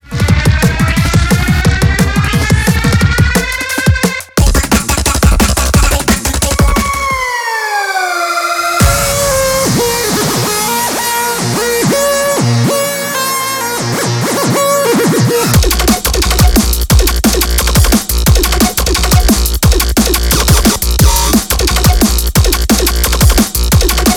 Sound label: NEURO FUNK; SCM MUSIC; FL Studio 21